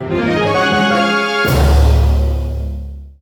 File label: Victory Stinger; The Wild Age